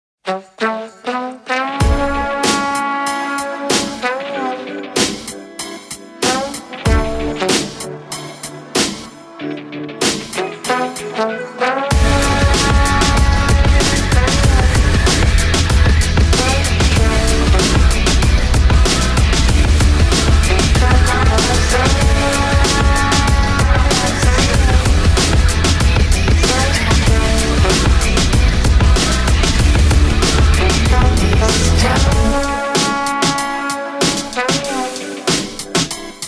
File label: beat